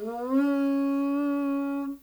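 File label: African horn, Mozambique horn; Spectrasonics; Heart of Africa 1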